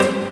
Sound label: HOUSE, House Dance